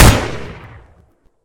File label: gun sentry, Gunshots; civ13; civ14; Weapons